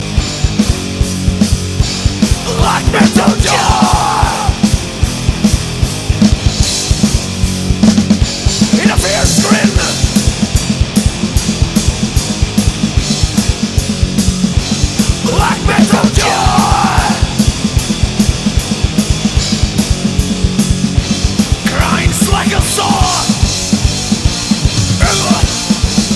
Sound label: black metal